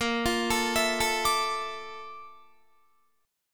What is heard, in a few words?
Bb Major Sharp 11th Bb down Guitar, chord; arpeggiated; JGuitar